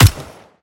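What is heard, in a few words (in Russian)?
демонстрационный выстрел из мелкокалиберной винтовки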